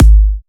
Kick, House Drum One-Shots

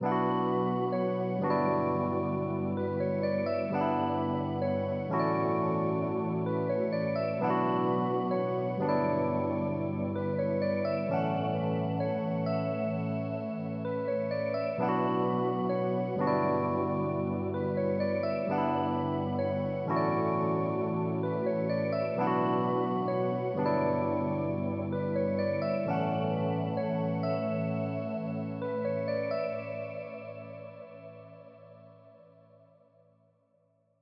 Sad Melody Loop - 130 BPM B Min Keys; Melodies; Loop Stems; Cymatics; Oracle Sample Pack

